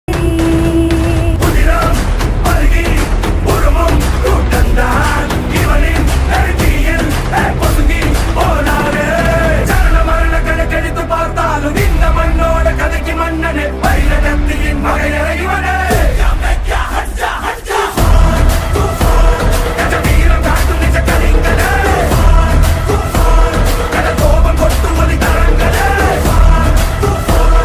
telugu ringtone

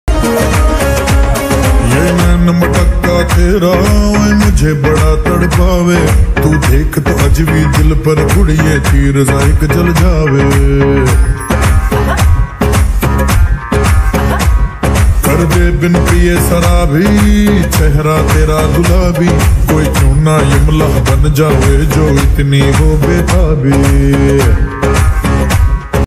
slowed hindi ringtone

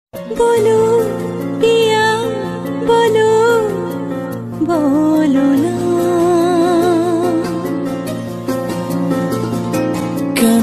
female bengali ringtone

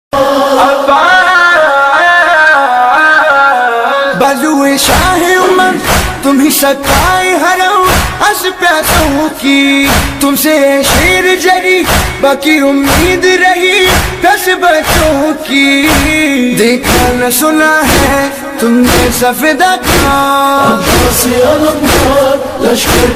islamic ringtone

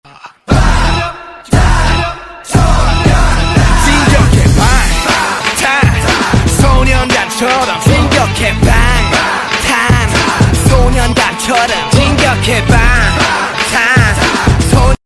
korean ringtone

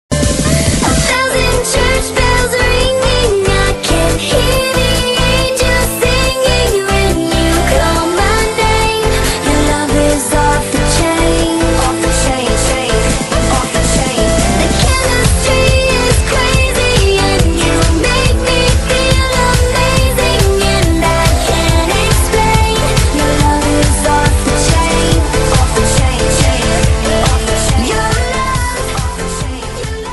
english ringtone

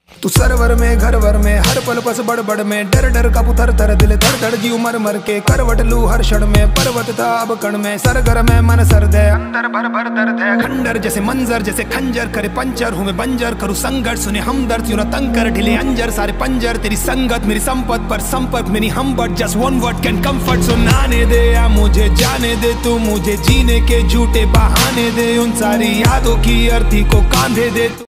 hindi rap ringtone

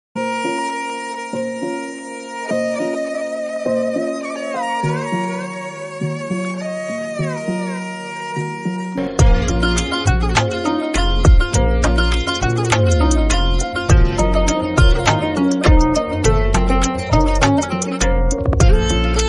BGM ringtone

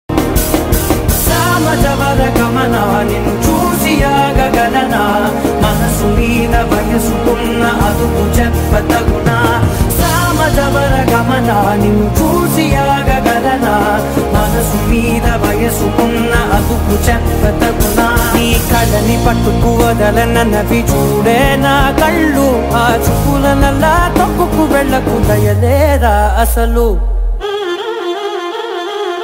tamil ringtone

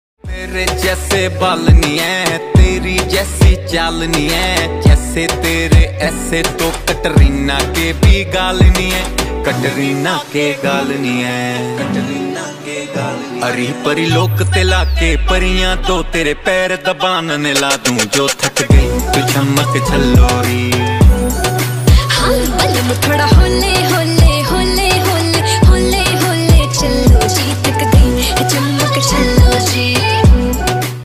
haryanvi ringtone

